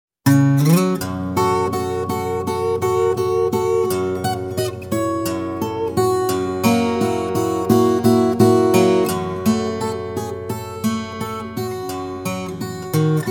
Blues

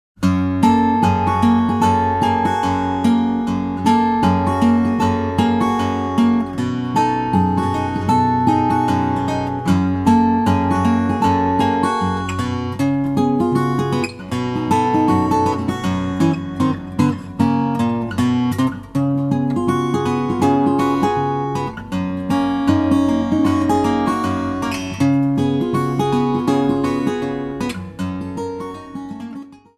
Blues

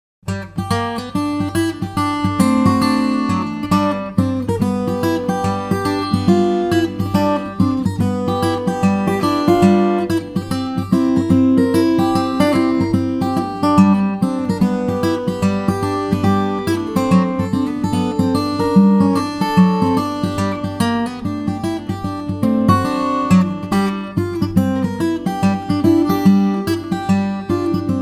High Strung Boogie In G, guitar

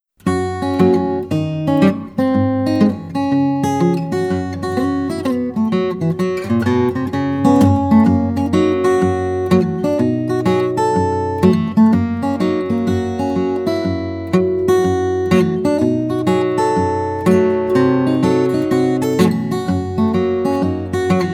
Raggy Blues In E, guitar

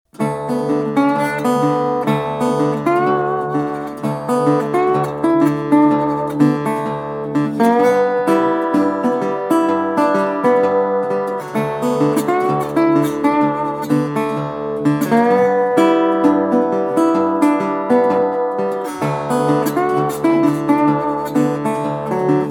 Whistlin' Honky-Tonk Slide

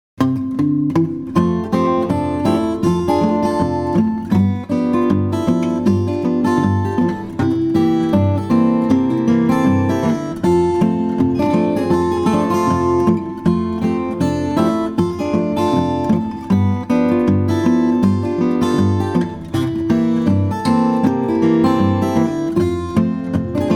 F & C Rag, guitar

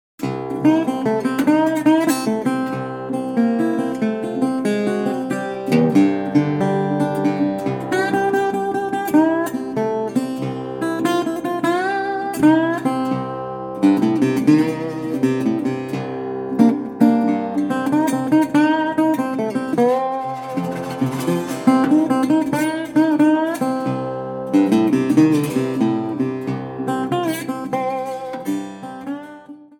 Blues In C

